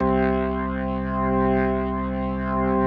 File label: C2S, B3